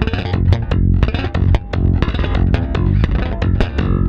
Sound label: PWR END, PWRSTRUM, Bass; Spectrasonics; Bass Legends; 128 PWRSTRUM